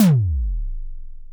TOM DOWN; Music Store Sound Service; Einstein

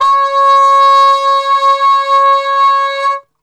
C#3, Brass, TRP CUP MUTE; Best Service; Brass Super Section; 90 s Sample CDs

